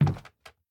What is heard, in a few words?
Wood soft